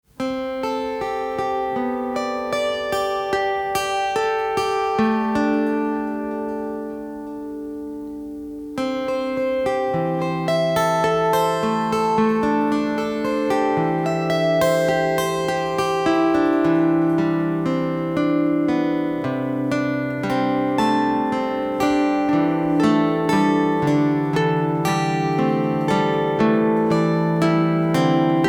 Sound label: Circulation Am Pentatonic, Guitar; The Guitar Circle of Europe